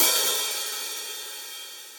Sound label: Hihat Open; Drum Hits; Standard Hits; Room With A Vista; Ableton; Factory Packs; Session Drums Studio